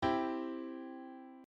ピアノ CEG

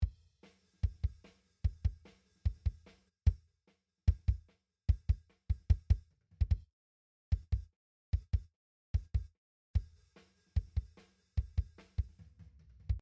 ドラム mix Expander Gate

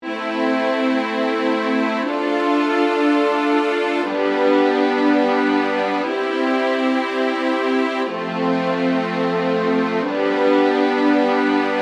ストリングスクローズボイシング

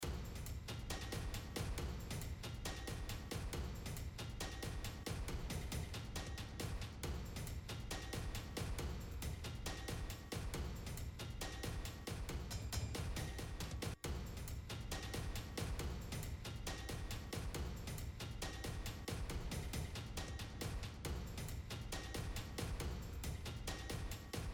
リズム

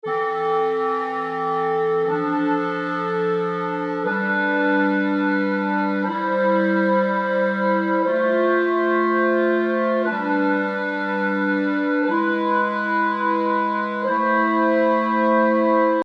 クラリネットオープンボイシング

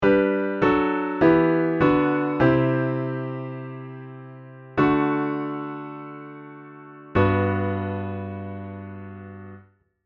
エンディングパターン 7th

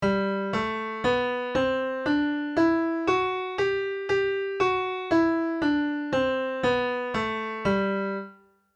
Gメジャー・スケール

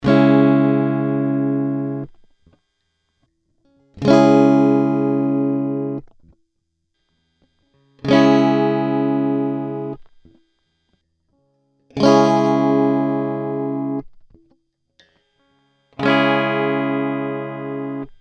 ピックアップノイズ

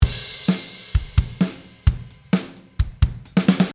drumフィル

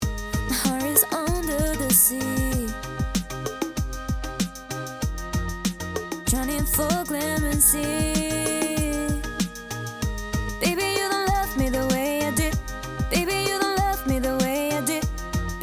イコライザー10Khz

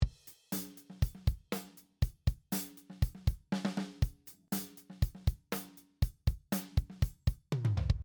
ドラム Bus コンプ mix

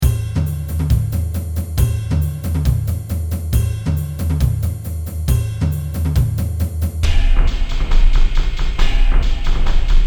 リズム加工

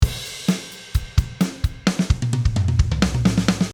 drumフィル

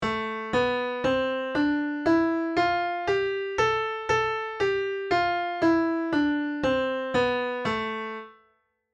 Aマイナー・スケール

